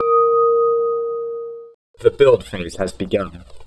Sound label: build phase; luminousforts; classic ctf